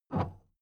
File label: big plastic case handle take